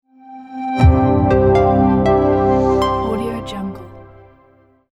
bright tech logo

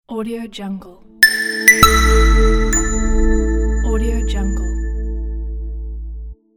flat logo

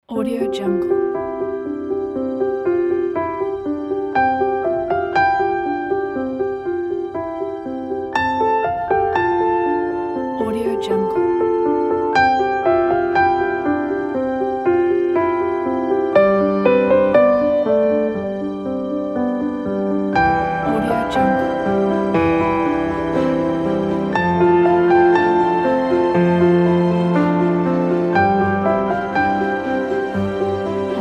romantic hope

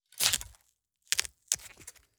stab punch hack

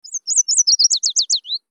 warbler single call; sound ideas

